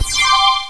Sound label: Wave Sweep; BabySmash 5.1